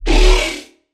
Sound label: Wobble; Insanity Mode; Routed Sound; Vital Expansion - Chaos Bringer Vol.1